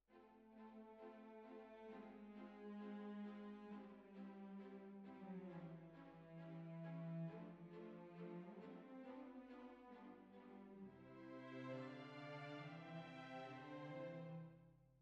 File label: Bassoon, Jazz for piano